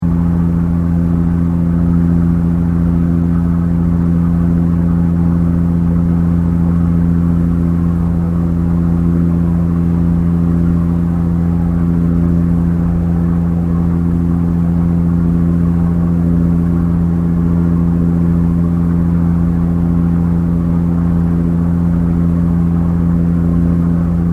Boat Tourist Ferry Moving Fast Loop; Boats; Vehicles; SoundEffects-Foundation1

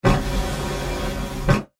Sci Fi Glass Shield Close Door; Doors

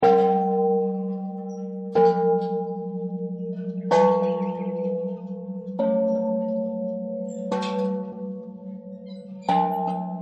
Buddhist Bells; Musical

